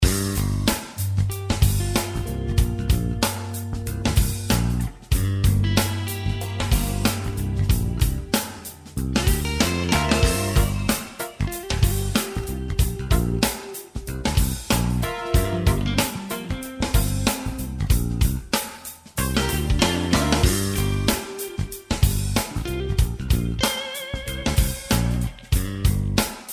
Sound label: Hip Hop Jam, guitar